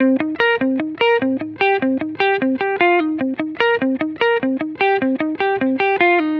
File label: Electric Melody Loop 150 BPM C Min; Cymatics